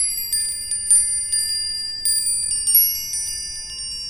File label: Dreamy Rod Loop